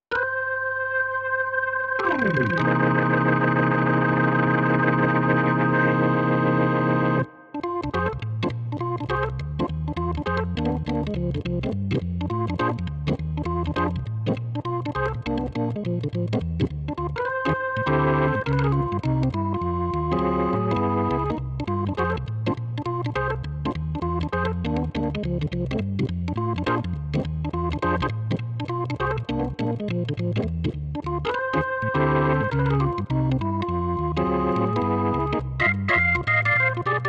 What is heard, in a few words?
TW Click Blues; dexibell vivo s2